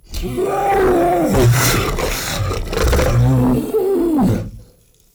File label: Ancient Tank Pain, Enemies, Infested, Barks; Warframe